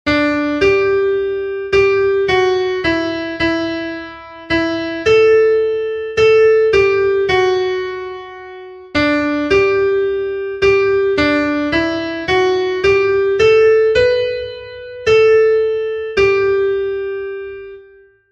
soprano, himnos en piano; BENDICE ALMA MIA; cantos del camino

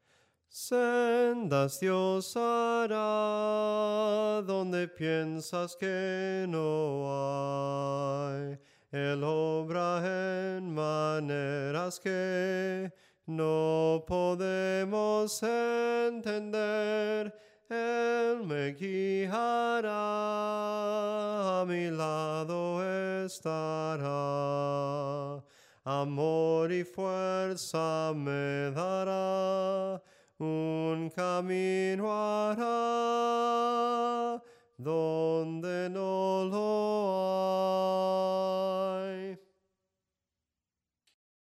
SOPRANO CANTADO, himnos en melodia soprano

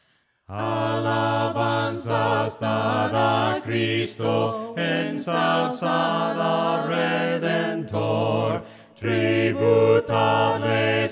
VOCES, himnos a capella; cantos del camino

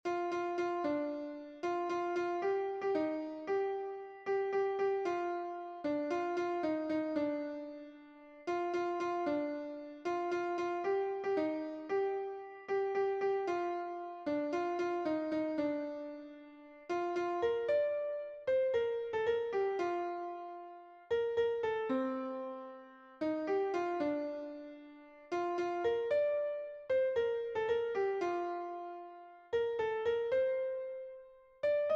soprano, himnos en piano; cantos del camino

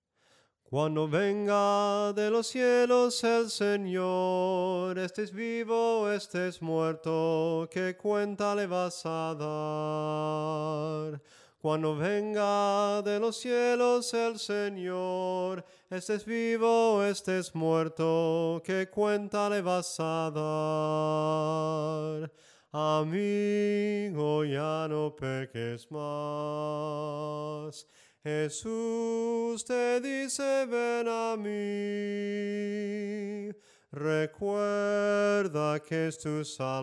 SOPRANO CANTADO; cantos del camino

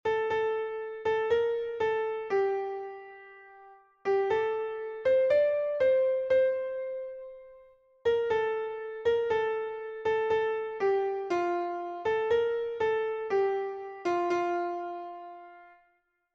soprano, himnos en piano; cantos del camino

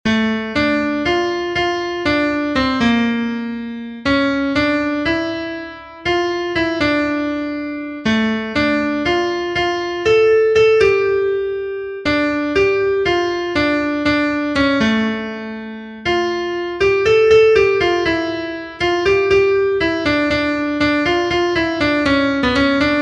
CONTRALTO, himnos en piano; cantos del camino

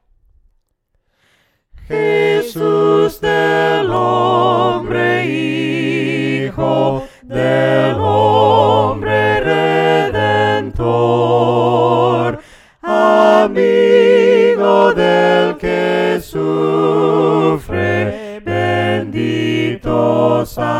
JESUS DEL HOMBRE HIJO VOCES, himnos a capella; cantos del camino

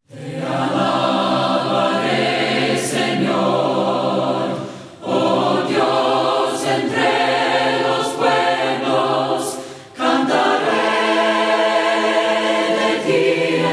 VOCES, himnos a capella; cantos del camino

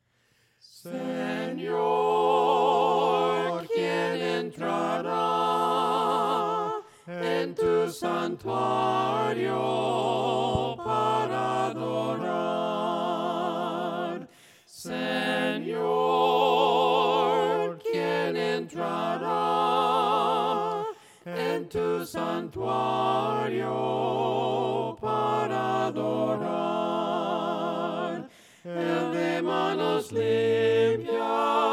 SENOR QUIEN ENTRARA VOCES, himnos a capella; cantos del camino

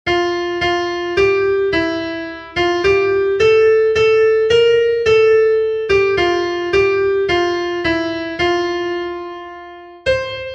SOPRANO, himnos en piano; cantos del camino

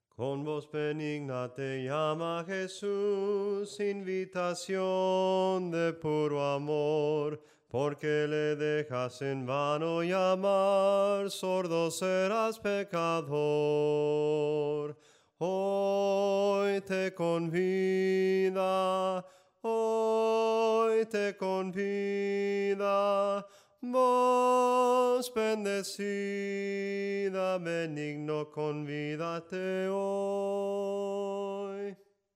SOPRANO CANTADO; CON VOZ BENIGNA

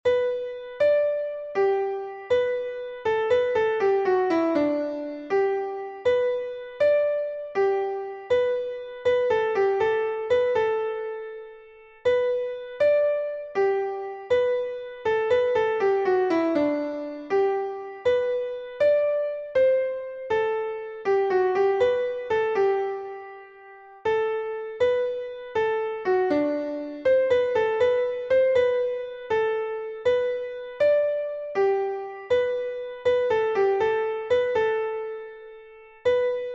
soprano; GLORIA GLORIA

